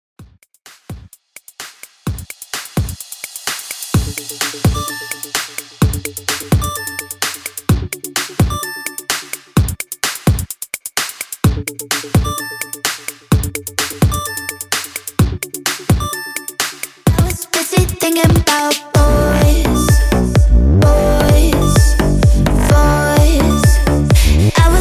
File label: 128 BPM